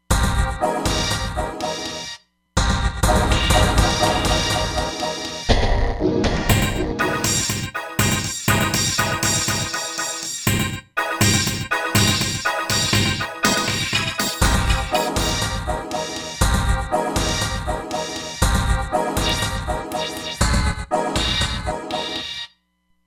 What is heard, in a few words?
Beat (C4)